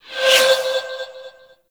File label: Spirit Primary Fire Whizzby